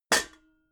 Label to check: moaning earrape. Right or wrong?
Wrong